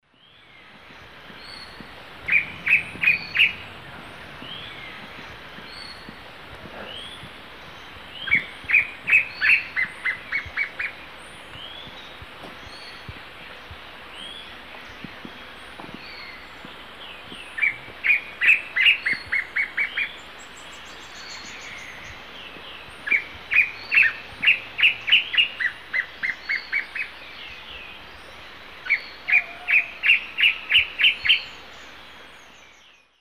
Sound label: Cercomacra serva, duet; S. America; Eastern Lowlands